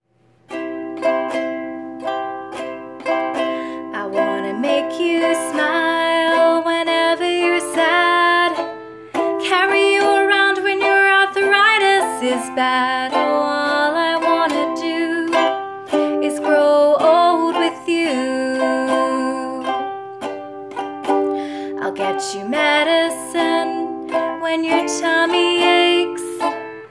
Singing

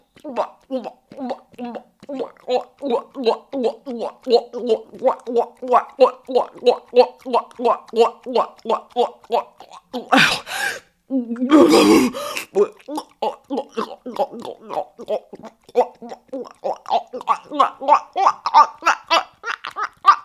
drool puke

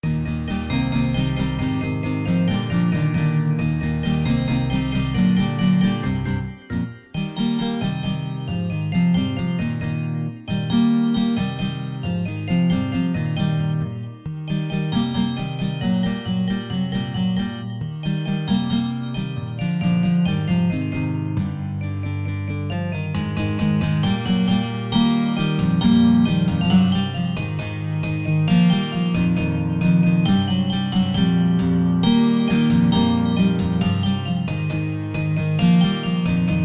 Guitar Cover

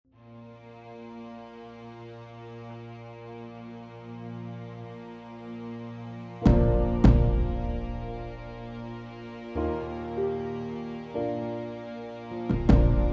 Instrumental Whistle

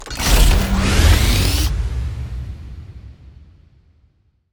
Monster; Zenless Zone Zero

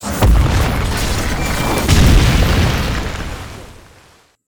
Monster; Zenless Zone Zero

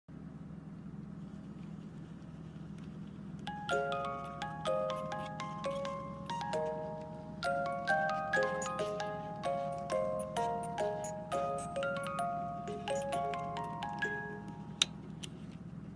Music Box Snippet

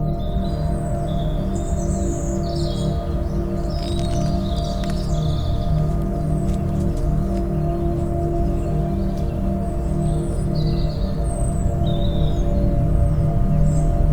SQUIRREL AMBIENCE; Pony Music Archive 21.03

